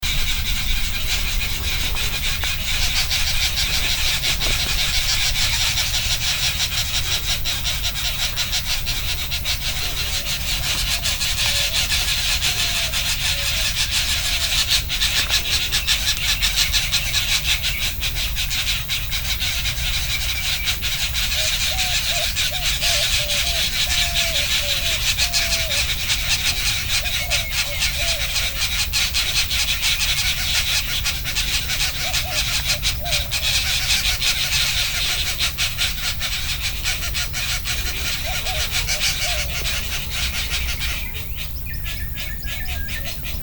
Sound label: Green Jays, Hidalgo TX